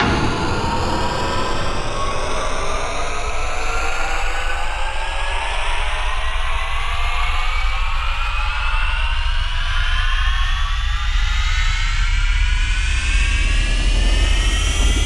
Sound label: Booster Sounds FX; Cymatics; Video Game Sample Pack Vol 1; Galaxy Rush